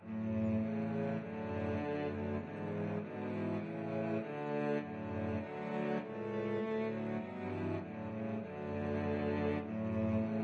cello trio

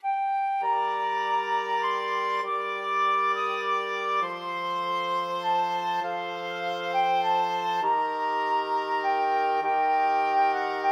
flexible mixed ensemble, 4 players

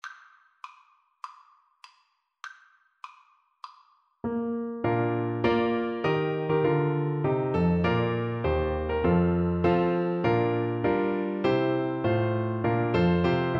stradella aria, Flute

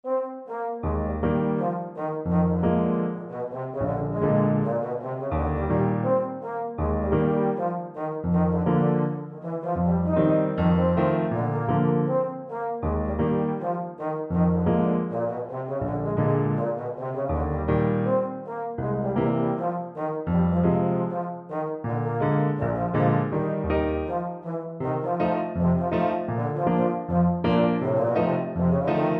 euphonium

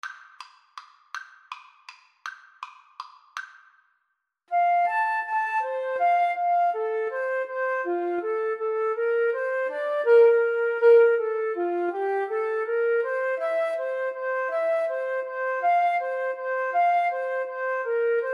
Flute Duet

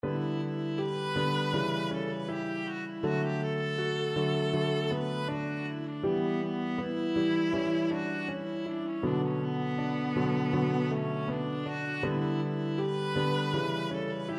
borodin polovtsian, viola

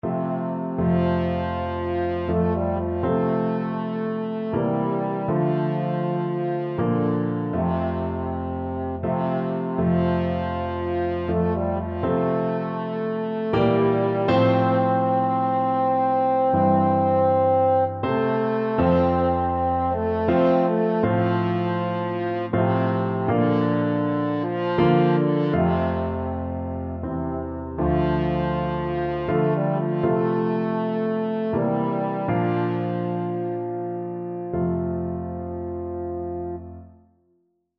amazing grace, french horn

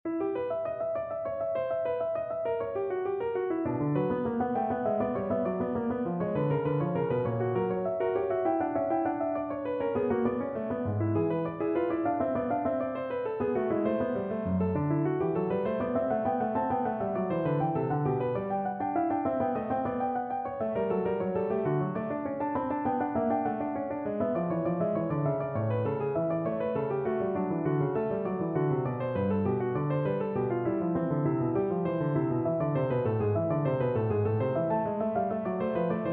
fugue

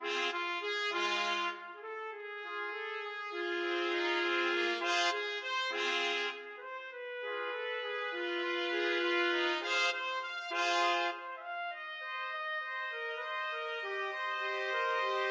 Didnt My Lord trumpet, trumpet trio

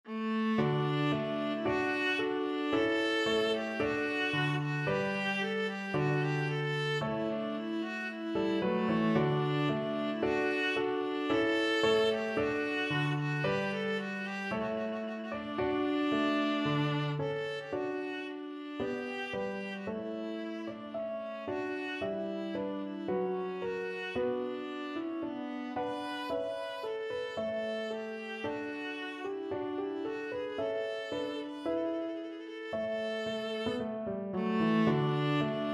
viola

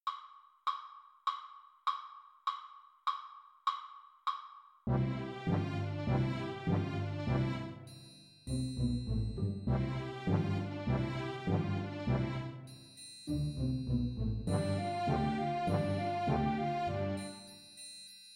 triangle, Percussion

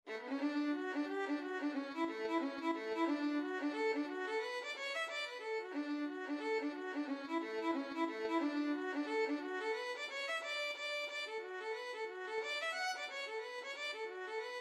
reel, violin

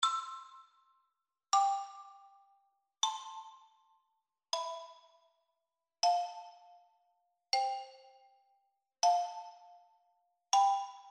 canon, percussion; pachelbel

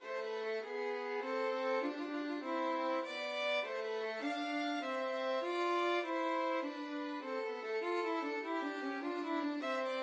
Minuet violin duet

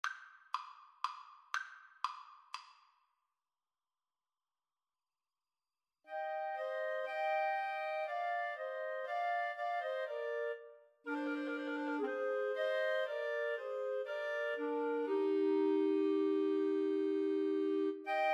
Clarinet trio

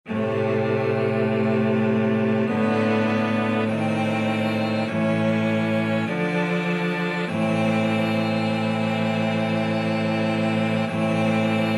aequale, cello trio; bruckner

